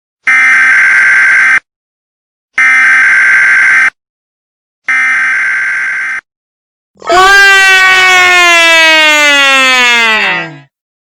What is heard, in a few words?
loud alarm; headphones beware